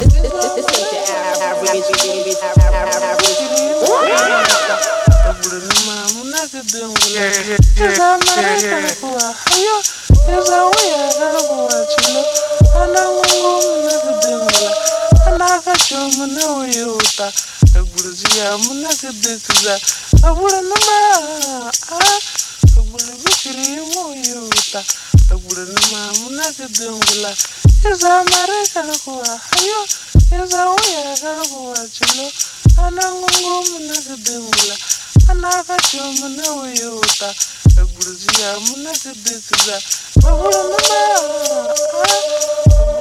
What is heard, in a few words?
chants